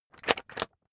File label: magazine skim bright